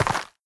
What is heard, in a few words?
step, footsteps, player, dirt